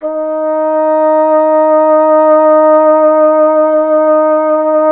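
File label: wind bassoon D; Miroslav Vitous - Solo Instruments VOL-1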